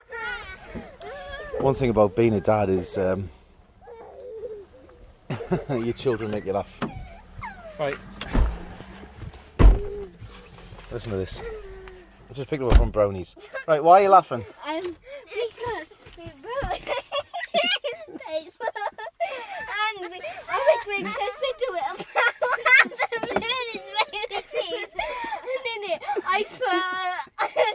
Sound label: ah they make me laugh